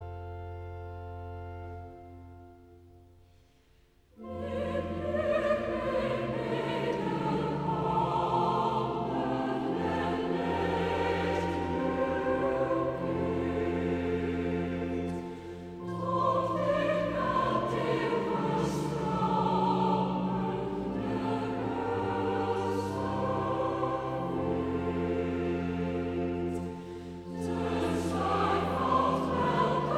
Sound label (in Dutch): Koor dames